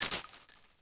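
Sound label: kar98 shell case fall; WAR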